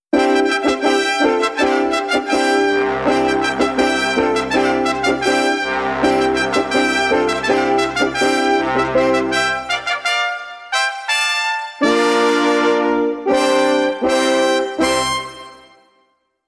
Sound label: Ringing The Trumpets